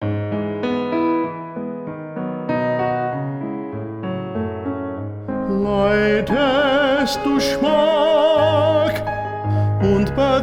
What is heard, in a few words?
tenor